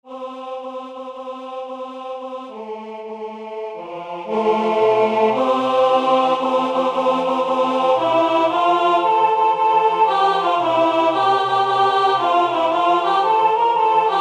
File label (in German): Alt, Swing; Intrada